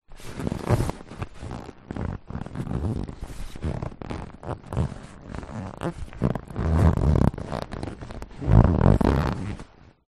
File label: leisure lilo or rubber ring inflated body movements squeaks creaks; zapsplat